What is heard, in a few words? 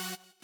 synth